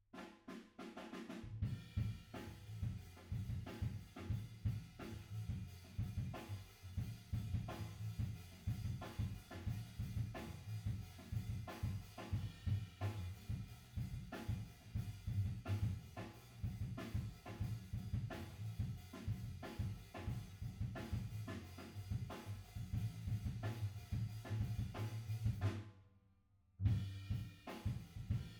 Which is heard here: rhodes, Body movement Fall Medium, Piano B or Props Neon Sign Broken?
Piano B